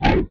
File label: Swipe; retro arcade; MMRetroArcadeSoundsPack